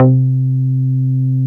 GRAND C3, BASSES

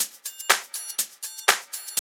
house loop

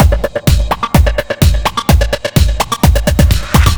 Fill, Drum Loops 127bpm, Nu Dance Electro; Planet Emergency

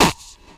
VOC DRUM, MOUTH PERC; Best Service Hyper Dance

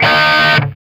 E 4 SOLDANO, Guitars, ROCK; Spectrasonics; Hans Zimmer Guitars VOL-1

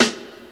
Snare Retro Mid Fi, Lounge Single Drum Hitz

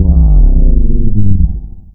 VOX FX; Big Beat Samples & More

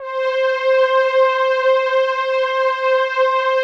PAD, DIGI-PADS

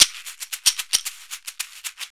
Caxixi Beats; Roland LCDP14 Africa VOL-2

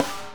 Distorted Snare

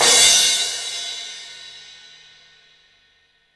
CYMBALS; 3D Sound; 3D Drums

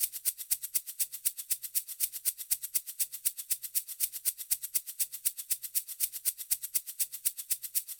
Shaker, 120bpm; Zero-G; Nu Disco; Nicengroovey C

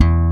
bass 3 (E); Sonic Foundry (Sony Creative Software); Ugly Remnants VOL-2; Basstuff

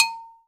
JANGKOQUI, Latin Hand Perc; E-MU Producer Series Vol. 2; More Studio Essentials; Composer

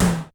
Yamaha RX-120 Tom El Hi

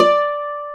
Celtic Harp D4; Planet Earth; Digital Sound Factory Planet Earth

